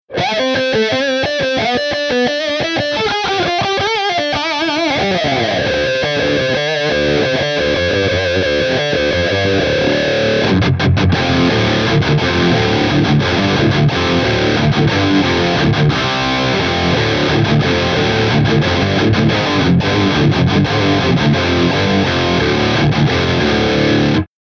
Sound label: Vox Wah Gibson Les Paul Bogner Ecstasy 412 ST Cab